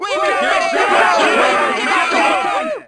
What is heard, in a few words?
burst, Crowd; Freestyle Street Soccer; XBOX